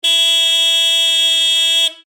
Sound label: rich tone horn m26 low; hella